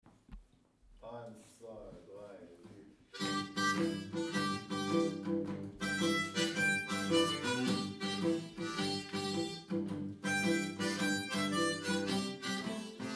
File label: no bend blues